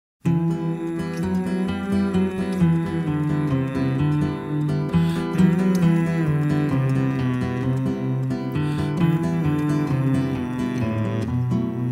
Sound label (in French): piano